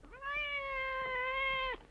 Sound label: Cat moan